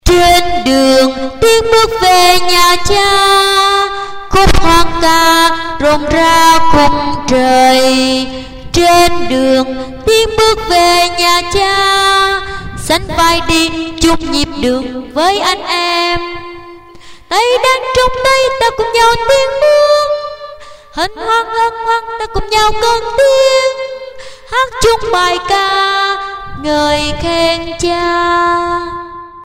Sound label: sop alto